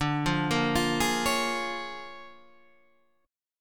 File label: Bb 7th Sharp 9th D